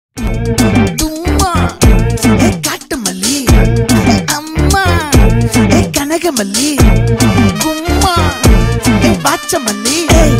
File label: Ringtone